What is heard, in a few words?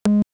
Fireflies Synth Loop